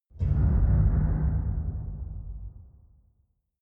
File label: deep metal impact, impacts; hits explosionen